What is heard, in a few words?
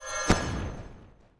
wisp death; Warcraft 3